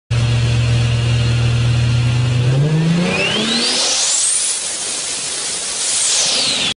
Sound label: Turbo sound